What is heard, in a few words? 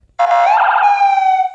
Siren Burst